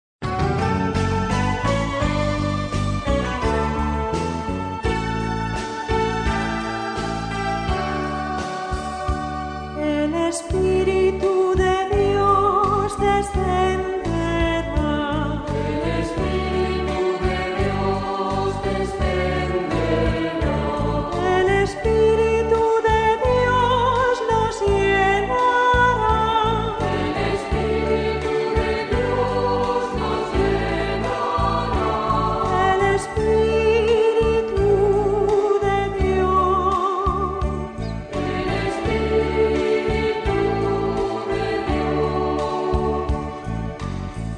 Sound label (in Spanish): Pentecostés (Canto de entrada); Cantos de Entrada y Comunion